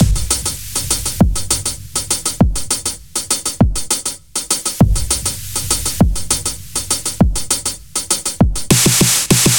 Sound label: 100 Beat; Zero-G - Future Wave